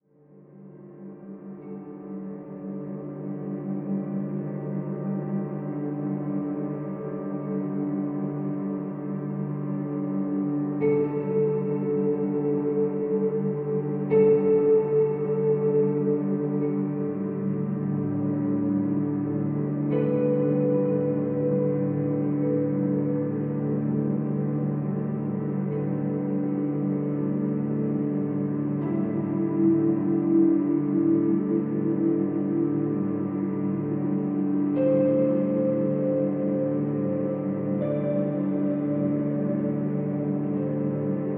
Musique d ambiance

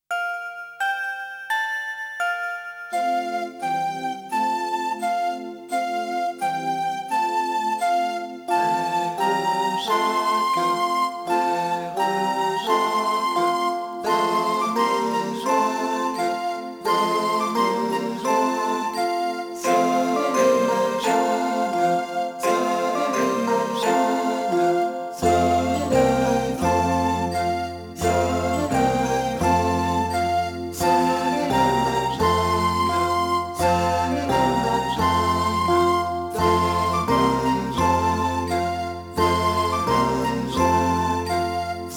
Playback orchestre; Fr res Jacques

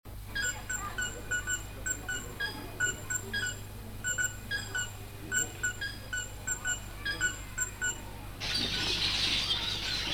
Un soir avec chants d oiseaux; Un soir moptii avec chants d oiseaux

